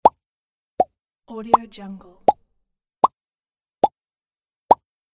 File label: mouth pops